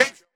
Fx Vox; Equipped Music; Loungin House

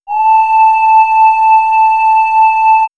Swirls Slur, pads; X-Static Goldmine

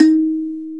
SANZA D#3; AKAI S-Series CD-ROM Sound Library VOL-5

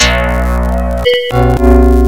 Kawai K3; Rubber Chicken Software Sample Bank

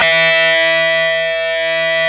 GTR, Dirty Buzz; Club-50 - Foundations Roland

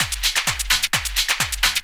130 addon, DRUMLOOPS, HIHAT

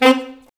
SAX A. STAB, Alto Section; Roland L-CD702

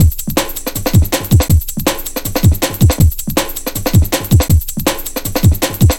glutton, Drum Bass Drumloops 160bpm; Zero-G; Total Drum Bass

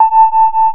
SYNTHESIZER DIGI DECAY

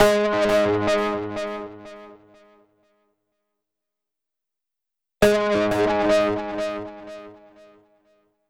SYNT; USB Soundscan vol.47; AKAI

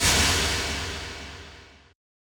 Yamaha DJX 2B, A Noise Echo

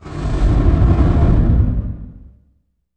IMP FLAME, Cinematic Impact

